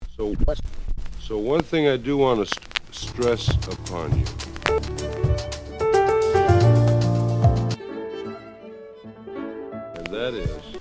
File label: jazz; schubert